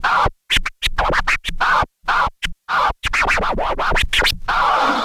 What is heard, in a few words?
Scratch